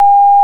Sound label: Confirm, Signalling Tones Elevator Phone